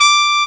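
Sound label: trumpet D5; ClockWerx Demo Music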